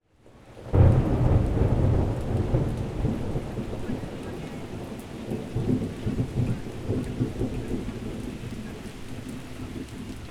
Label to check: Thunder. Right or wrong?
Right